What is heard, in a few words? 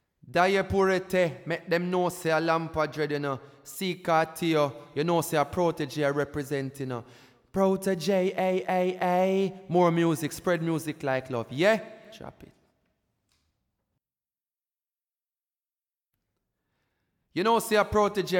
jingle; daje pure te